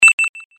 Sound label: Point Email, 8-Bit